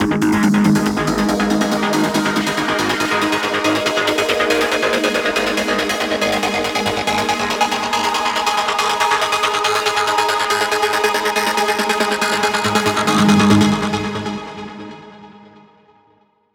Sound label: 140BPM PsyTrance G#, Melody Fills; 8Bars; Vengeance Samples